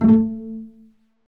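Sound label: strings, contrabasses pizz; String Master Series; Cbs FX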